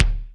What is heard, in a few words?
BD, DR BD; Best Service Gigapack 2